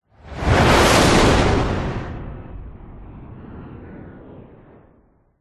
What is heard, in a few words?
vehicles fighter jet pass by zoom past very fast designed